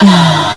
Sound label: alert; schall; aslave